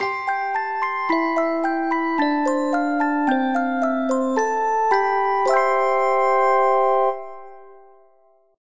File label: JRS Chime